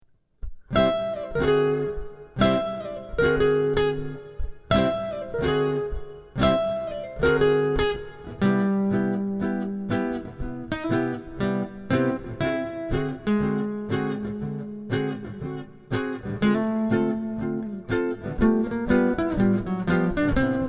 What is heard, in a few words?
guitar